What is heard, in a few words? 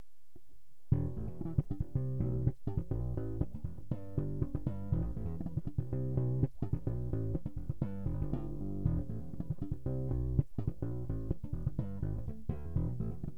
Slap PU Neck EQ on